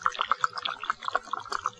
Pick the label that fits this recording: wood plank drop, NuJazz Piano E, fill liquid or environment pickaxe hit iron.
fill liquid